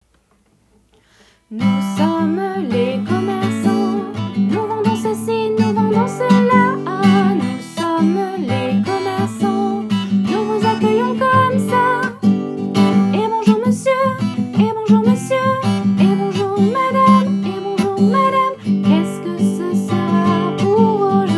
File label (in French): Chanson des commerçants